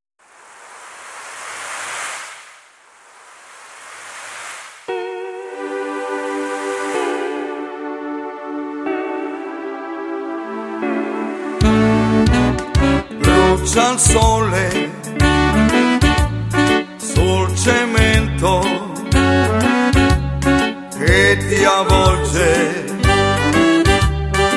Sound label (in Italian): le sirene